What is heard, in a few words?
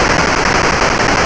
machinegun